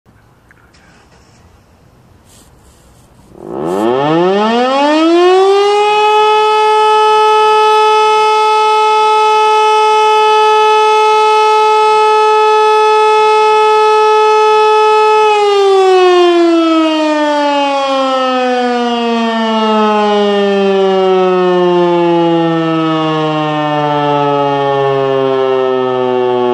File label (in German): Probealarm Sirene; Gerichshain